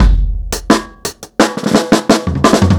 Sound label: Fill Beat 4-Bar, Drum n Bass; KJ Sawka - Drum n Bass & Beyond; Beats and Percussion